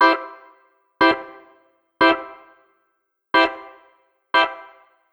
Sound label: Hamm Org, keys